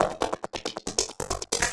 percussion loops, Techno, 138

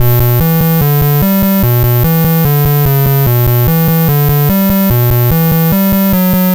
Apple II Bass, Chiptune; Sonic Foundry; Sony Creative Software

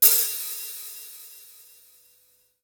HAT, Hip Hop

